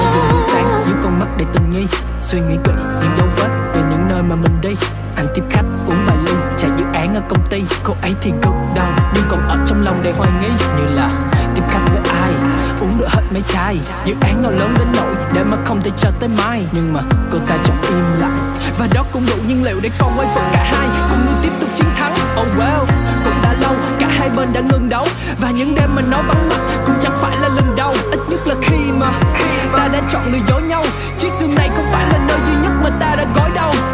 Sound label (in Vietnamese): Nhac Chuong Rap